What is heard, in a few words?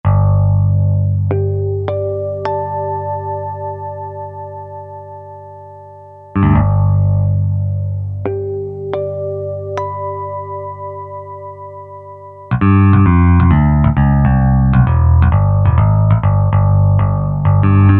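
bass and harmonics; E-mu Proteus FX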